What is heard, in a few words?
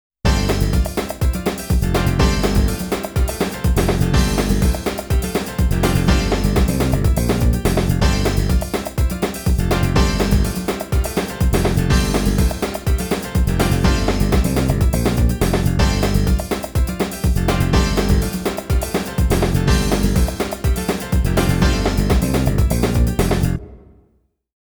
Goal Replay